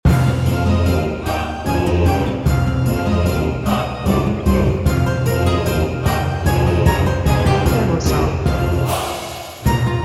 Choir Loop

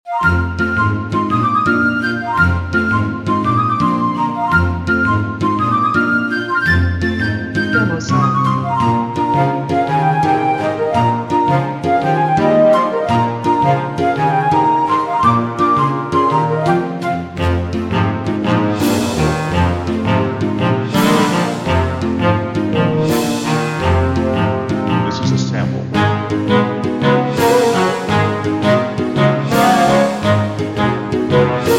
Lively Playful Mood Loop Bouncy Flute

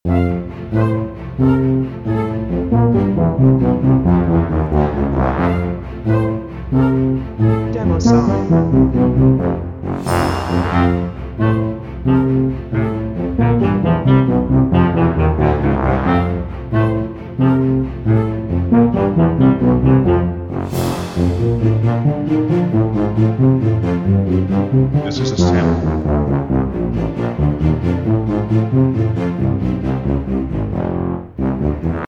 Bouncy Flute and Funny Tuba, Loop Hectic Tuba

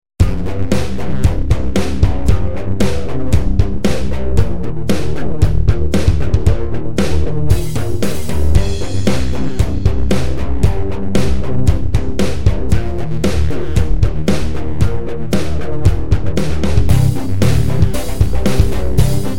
Rack Tackno

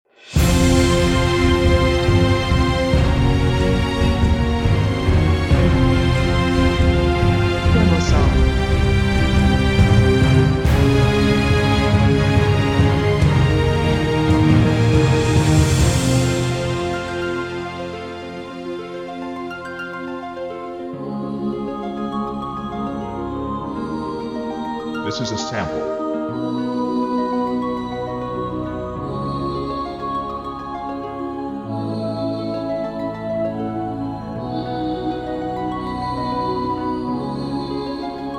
Rise of an Empire Choir Ending

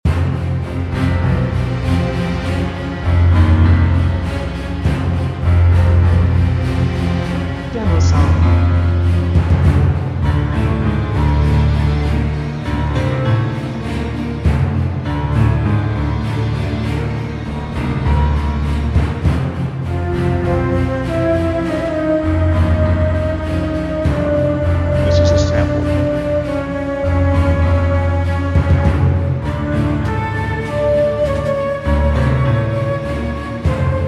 Flute Loop

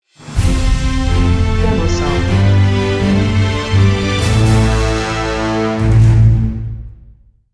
Stinger

